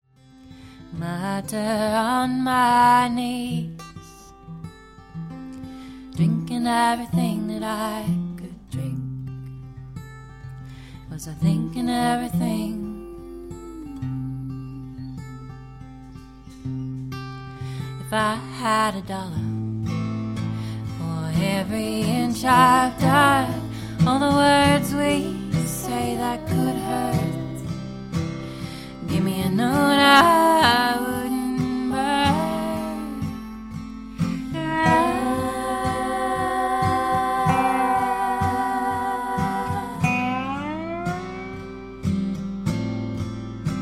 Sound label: female acoustic duo